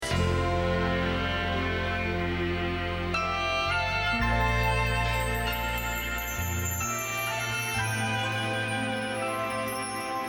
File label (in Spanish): Bajo